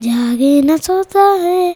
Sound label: CHILD VX C, Indian Traditions